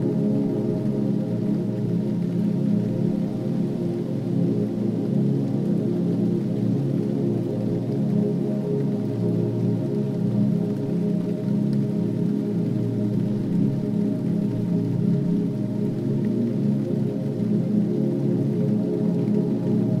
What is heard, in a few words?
rain; ゆめ2っき